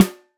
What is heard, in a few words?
snare organic